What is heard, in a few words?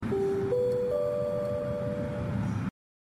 Subway; Bilbao